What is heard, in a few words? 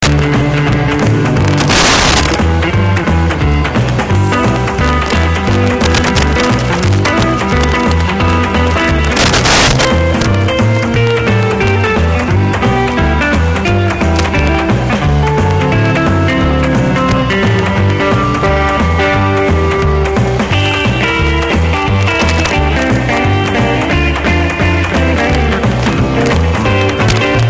guitar boogie via laser